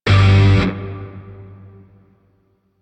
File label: Shawzin Blues Chord G; Grineer; DE; Warframe